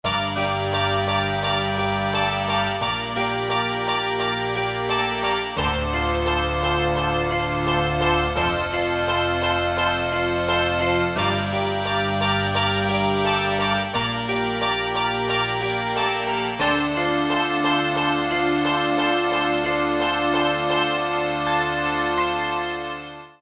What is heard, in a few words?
Midi Wave, MIDI Combos, Korg DSS-1